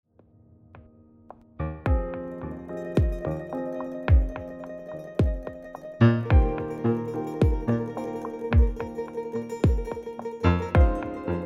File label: mandolin world music